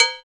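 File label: PER PROCU, Procussion, percussion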